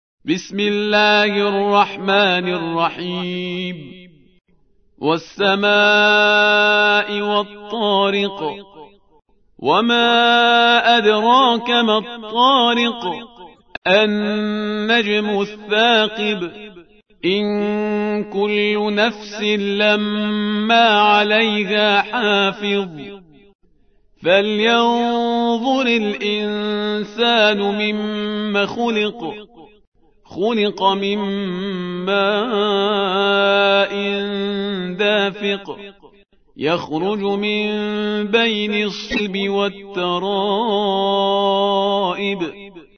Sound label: tariq surah